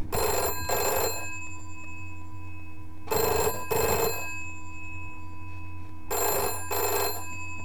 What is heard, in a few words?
bells